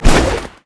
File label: snowday basicattack; LoL; ziggs snowday